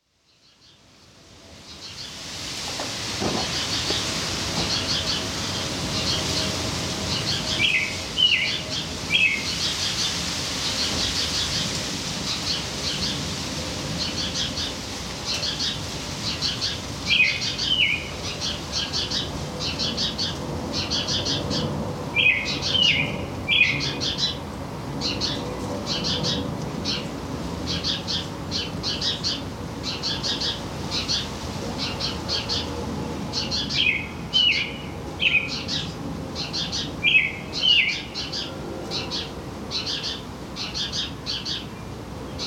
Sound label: Ambience